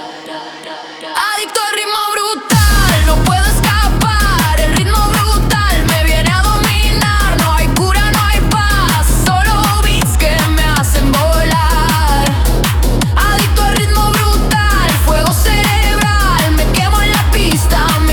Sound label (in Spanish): RITMO BRUTAL; sexy beats